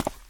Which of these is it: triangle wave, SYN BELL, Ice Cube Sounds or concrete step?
concrete step